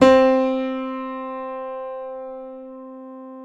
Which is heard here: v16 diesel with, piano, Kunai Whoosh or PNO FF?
PNO FF